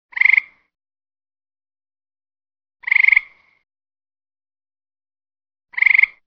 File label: Treefrog; frogs toads; tadpoles amphibian